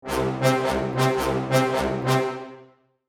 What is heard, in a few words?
bouncy low brass